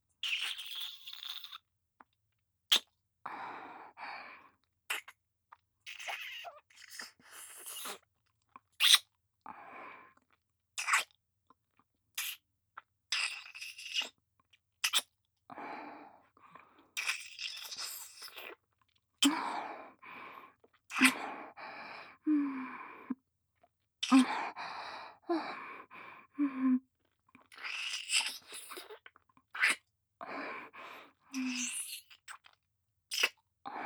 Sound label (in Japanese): 乳首舐め(吸って離す)